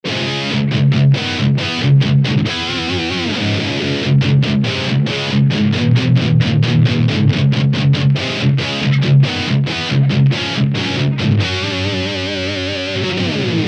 BOSS GT1000V3 UB METAL OD PS EQ LINE OUT

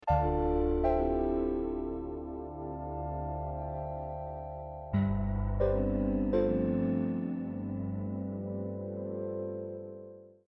sq80 cross

